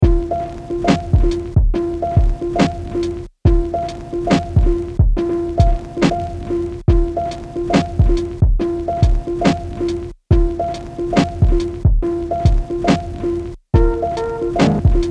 140bpm MPC; beat pour mcee; NecrOSe

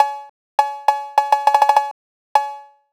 Addictive Drums

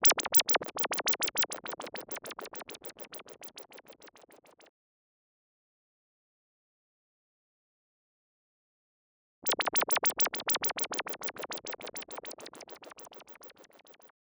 Freeze MS-20

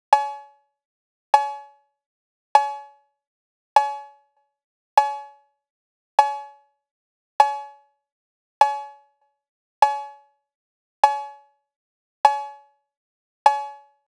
Addictive Drums

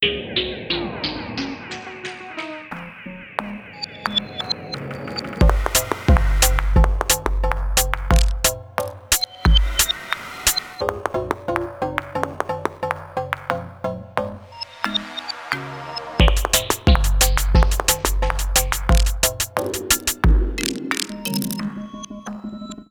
178bpm

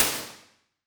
Wood Room Small; Max Audio Effect; Convolution Reverb; Real Places

